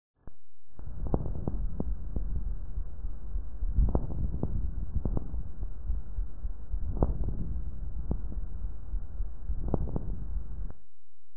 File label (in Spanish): Frote Pleural